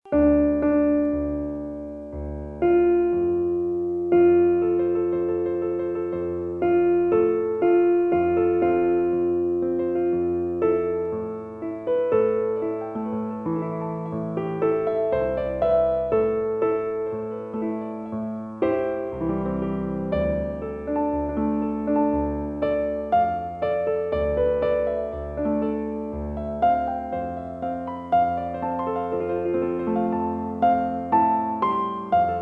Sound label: Piano